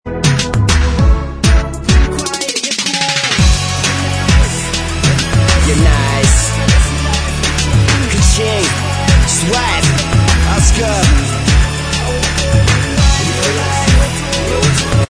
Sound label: Bvox Track